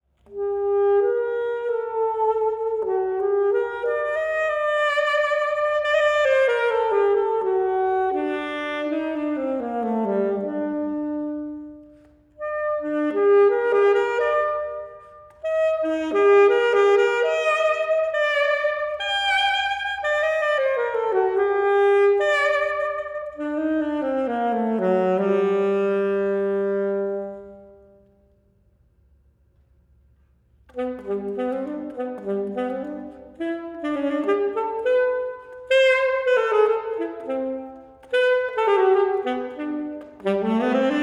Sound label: Sax Ending